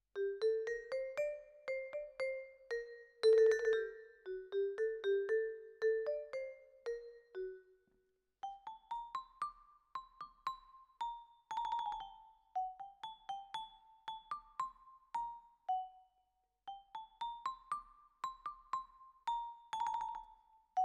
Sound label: Vibra Close